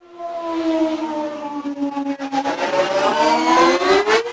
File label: Crash By, vehicles